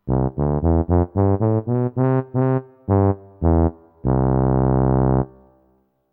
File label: tuba short